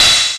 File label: cheap open, hats, percussion; SamplePool3